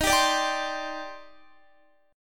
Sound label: D sharp Major 13th, down, Guitar; D#M13 strummed